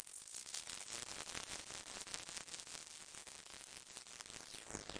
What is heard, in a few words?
fried circuitry effect; Computery or Science Fiction